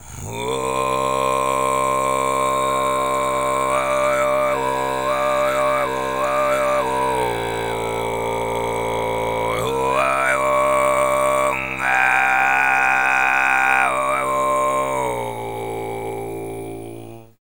TV FREE, Vocal World Voices TUVAN LOW; ILIO; Vocal Planet VOL-5

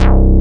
SYN EASY C3, Roland; Sound & Vision - Gigapack I CD 2

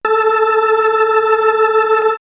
Fast Leslie; X-Static Goldmine; backtothe70's

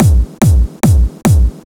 144 BPM Kick 4 4; Vengeance - Essential Clubsounds Vol.5; Flight Kit Root Amin; Drums & Perc

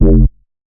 Bass F, Bass Synth; Instrument Oneshots; 99Sounds; Monosounds

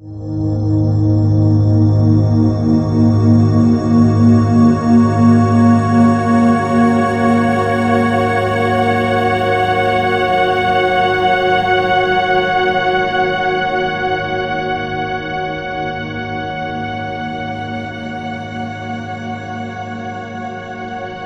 ARPEGGI R, Ominous Drones; USB Soundscan vol.48; AKAI

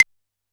D#5 Claves, Yamaha PSR-E323 Standard Kit

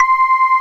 VELIMBA; MORPHEUS

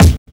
kick, Drum and Bass; EZ Rollers; Kick Set 3; Instrument Samples

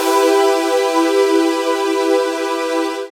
SYN PAD, dance synth; Sound & Vision - Gigapack I CD 2 (Roland)

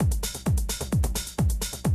130, Classic DrumLoops

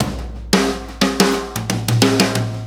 SD TOM, ACID JAZZ; Best Service; Advanced Media Trax Modern Composer

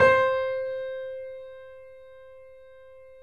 3D Pianos Yamaha C7; E-MU Producer Series Vol. 5

